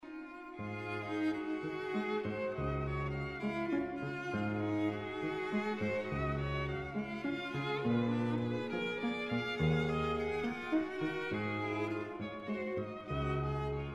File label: piano trio; volkmann